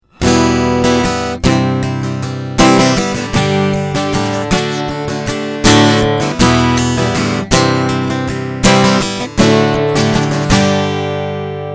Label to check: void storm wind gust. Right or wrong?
Wrong